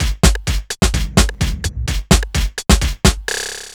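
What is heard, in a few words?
Percussion, Drum Loops 128bpm; Zero-G; Soundclash Nu Dance Electro; Acidized Wavs; Jock n Roll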